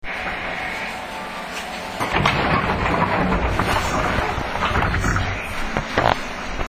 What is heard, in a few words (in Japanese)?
ドア開け, 201系LED更新車; 国鉄時代の通勤列車代名詞。; JR西日本; JR西日本での数は減ってきている。